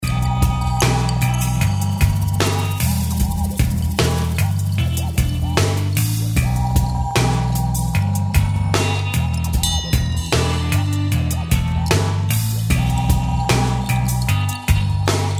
BLUES

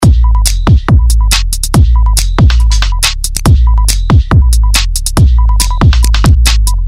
ELECTRO CLASH

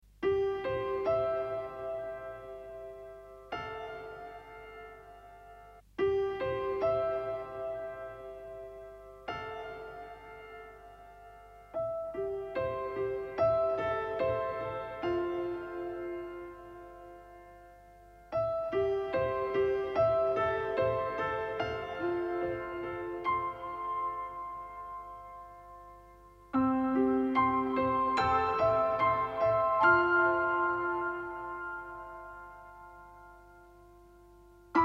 PIANO

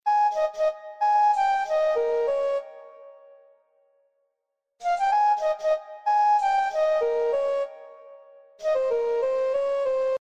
FLUTE AFRICA

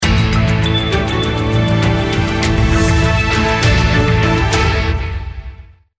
JINGLE

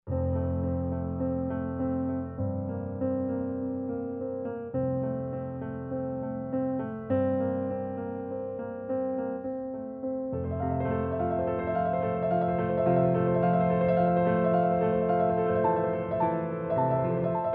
EVOLVING PIANO

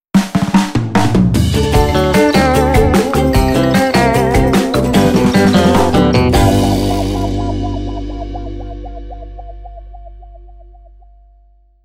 JINGLE

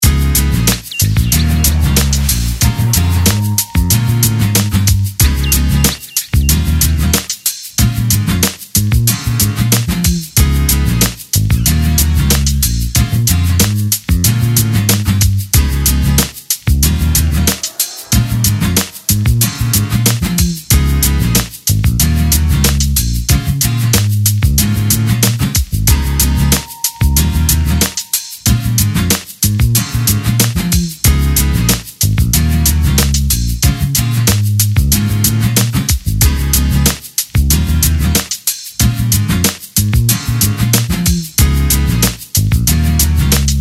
cruising accoustic guitar

